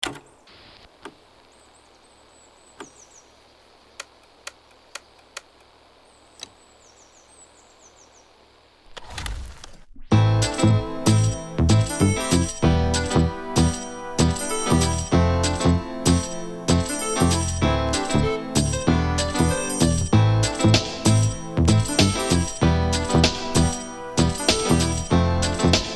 Latin Drum Machines From Hip Hop to Champeta and Disco